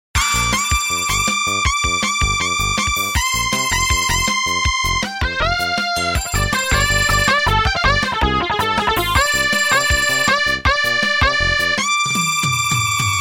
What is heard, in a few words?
samsung wave new ringtone